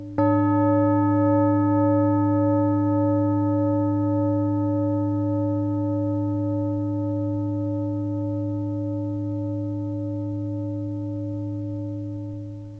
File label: Singing Bowl